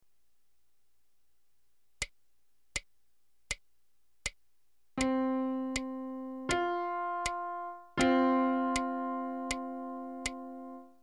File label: interval